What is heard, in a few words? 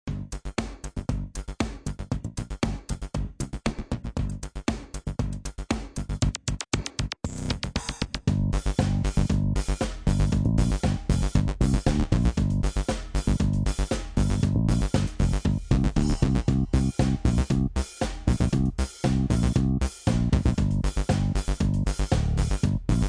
Backing Track; animal jam classic ost linux macos windows gamerip 2010; Club Geoz